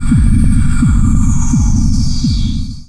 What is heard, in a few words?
haste